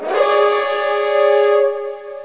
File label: klaxon